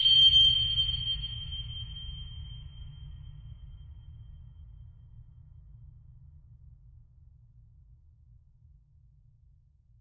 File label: beep